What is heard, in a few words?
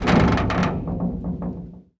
move, hinges drawers; seesaw; Half Life Model Pack; Half Life Alyx